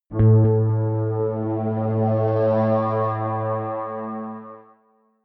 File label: synth bounce